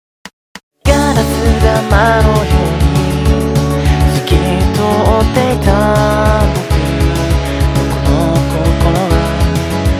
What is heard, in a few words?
glass